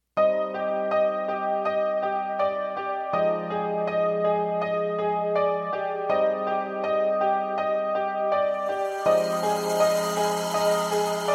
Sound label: Romantic Music Ringtone